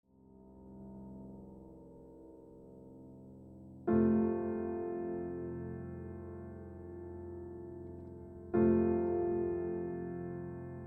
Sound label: Improvisation